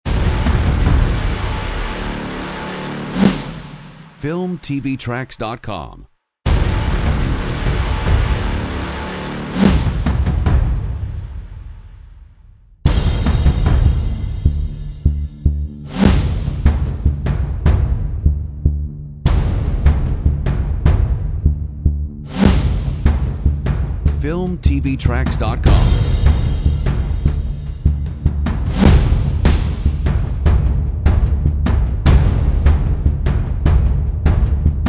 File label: Explosive Percussion